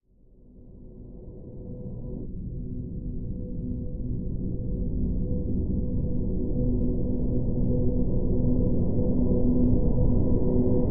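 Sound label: drone B; Drn Delusion B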